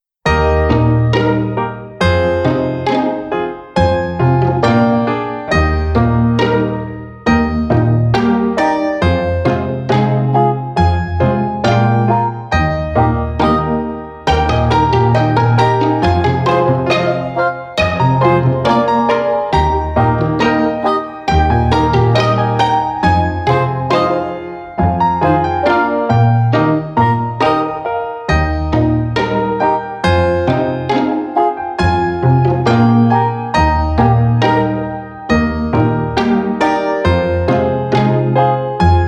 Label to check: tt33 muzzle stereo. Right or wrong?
Wrong